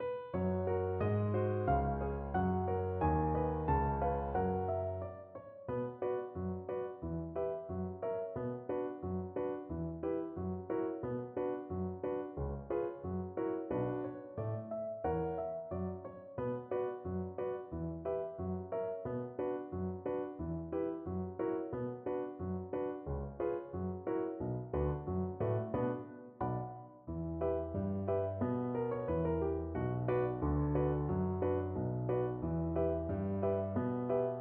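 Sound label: VLC karaoke, Cello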